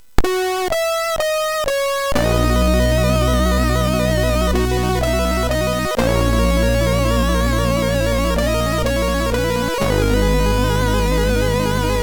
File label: Slowy, MOS6581; MOS6581R4; hvsc